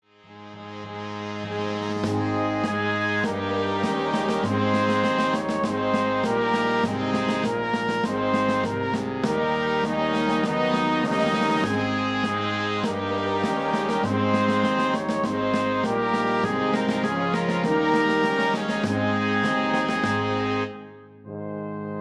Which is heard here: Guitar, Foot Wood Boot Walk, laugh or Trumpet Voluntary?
Trumpet Voluntary